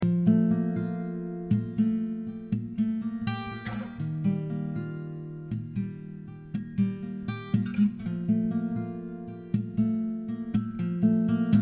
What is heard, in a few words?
F120 Acou GTR motif